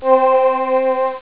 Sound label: choir; Amiga Soundtracker sample